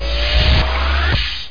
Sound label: HYDRO; Duke Nukem 3D Kill-A-Ton Collection